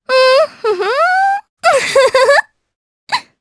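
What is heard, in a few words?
Vox Hum Japanese; Epis; kingsraid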